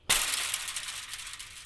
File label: fence rattle